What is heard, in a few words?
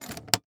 Household Briefcase Lock